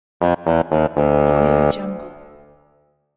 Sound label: wrong answer; supersoundfactory